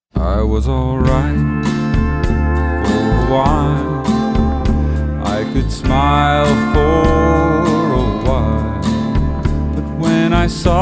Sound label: cryin; sirens